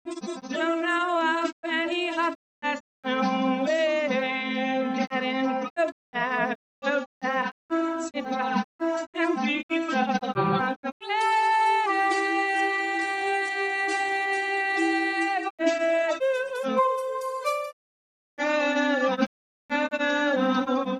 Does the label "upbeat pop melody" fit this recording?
No